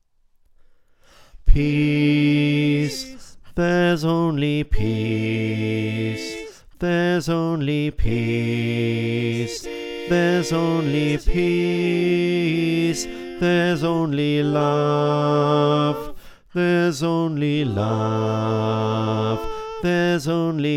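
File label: Bass, choir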